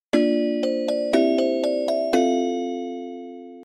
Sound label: Jingle